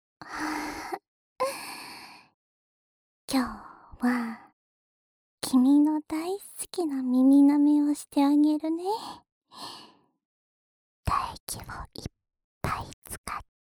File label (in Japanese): 深い耳舐めループ（両耳), ASMR; オナサポ用